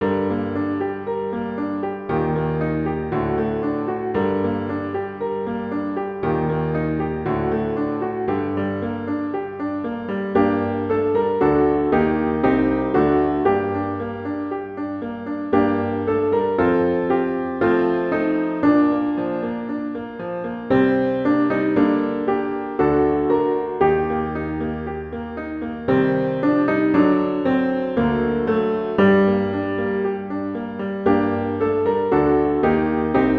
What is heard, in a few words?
ACCOMP; Les Miserables PART C